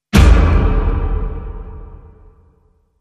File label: Main Hit, Sting